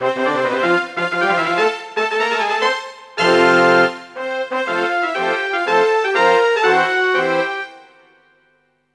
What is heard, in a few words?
Brazil, Fanfare; 1997 MMX LAN PACK (win 95); Worms Armageddon